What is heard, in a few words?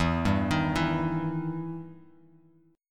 E Minor Major 7th E down Bass Standard; EmM7 arpeggiated